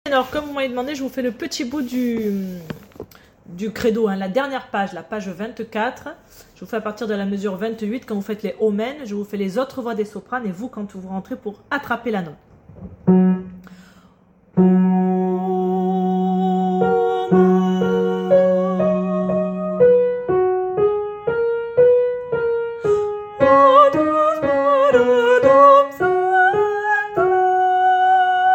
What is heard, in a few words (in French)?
Missa fin du credo tenor